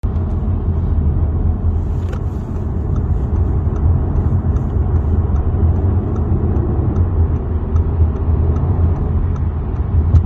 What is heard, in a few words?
audi a6 c7 2.0 tdi ultra whistling whirling when accelerating